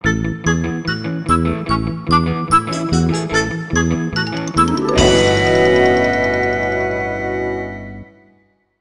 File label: Bari Sax